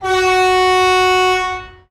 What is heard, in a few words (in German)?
Horn Tief und lang